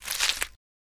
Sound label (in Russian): zvuki listev salata